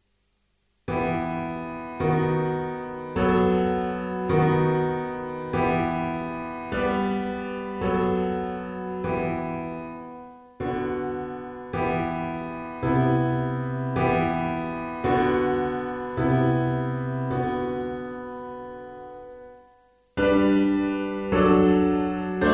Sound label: piano pop